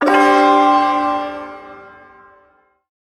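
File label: Shawzin Pentatonic Major Chord; Pent Maj Chord B; Warframe; Duviri Ersatz Shawzin; Chords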